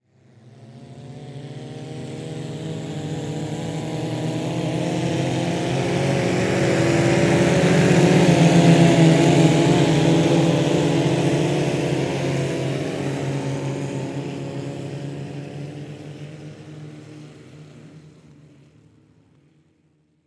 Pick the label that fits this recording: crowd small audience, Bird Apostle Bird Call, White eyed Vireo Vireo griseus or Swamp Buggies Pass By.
Swamp Buggies Pass By